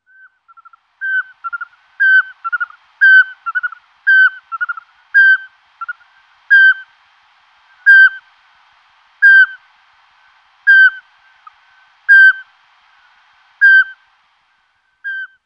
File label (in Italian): Civetta Nana Glaucidium passerinum; Versi Animali; Il Forum della Fotografia Naturalistica